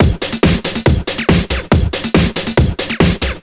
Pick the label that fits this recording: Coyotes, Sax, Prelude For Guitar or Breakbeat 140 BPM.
Breakbeat 140 BPM